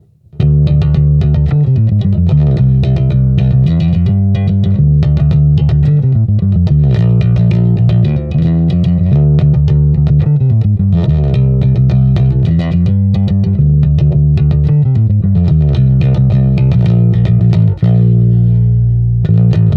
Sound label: Hartke HA 5000